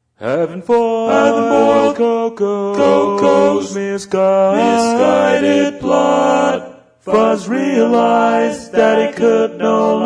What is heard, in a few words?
quartet lofi